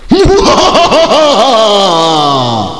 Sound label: dungeon master laugh